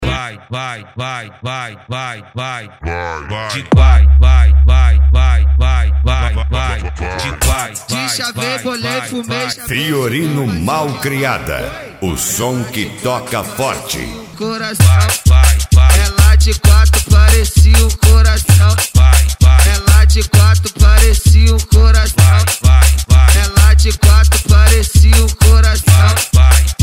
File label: mega funk bass